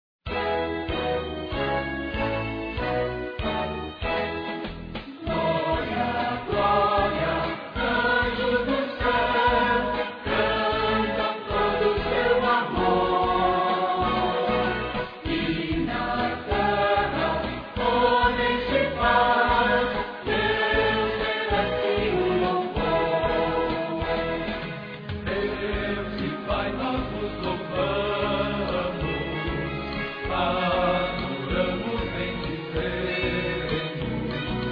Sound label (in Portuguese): Gloria Gloria Anjos no Ceu, cantos